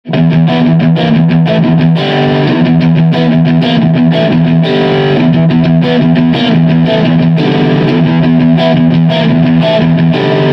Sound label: strat modern overdrive